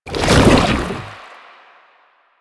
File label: Chem Strike Goo Layer, Footsteps; Enemies; Soldiers; Foley; Warframe; Lasrian; Nox